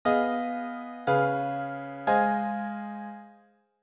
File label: III-V-I